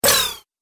Droid Flyby Shot, robot; Robot Sound Effects

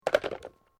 TELEPHONE PUSHBUTTON OLD HANGUP; Old style telephone; Telephones; Electronics

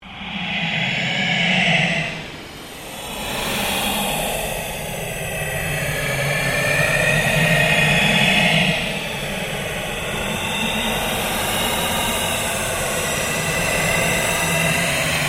Ambient Drone Ghost Chatter; Sound effects created by manipulating other sounds; Scary Soundscape; Scary Soundscapes

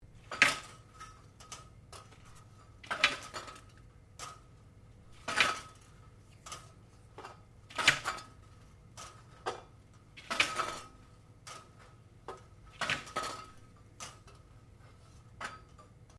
Blinds Venetian Movement, Household blinds; Living Room

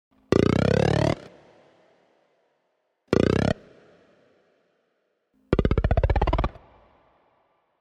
Abstract electronic frog croaks; Electric Croaks; Strange Tones; Sound Design

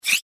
Chirpy Air Whoosh

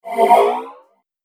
Future Vroom, whoosh sound effect

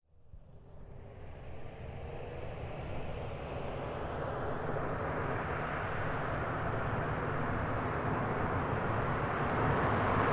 Car Highlander Up Stop Away Dirt Slow; 2005 Toyota Highlander V6; Cars; Vehicles

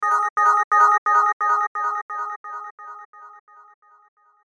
Bell Resonance Ascend Delay; Ascending; Blastwave

